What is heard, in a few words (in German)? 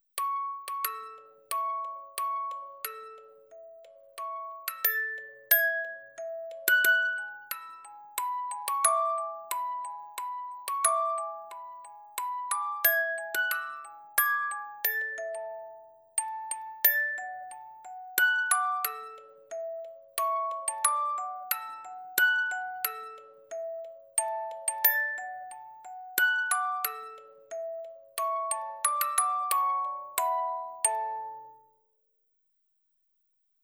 spieluhr roh